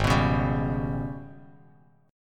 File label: G sharp Minor Major 11th, Bass Standard; strummed; JGuitar